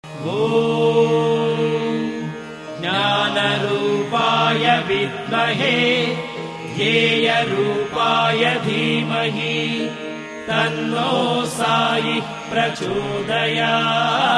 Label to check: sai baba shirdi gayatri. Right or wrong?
Right